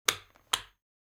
handbag lock

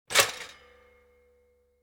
toaster up; appliances

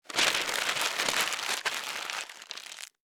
paper rustle

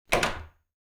door open

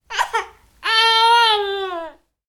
baby cooing; human

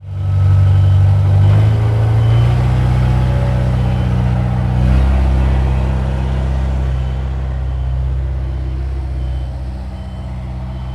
tractor reversing; transportation

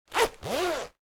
jacket zipper, cloth

